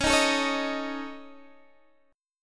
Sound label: Db Suspended 2nd Sharp 5th, Guitar, Open D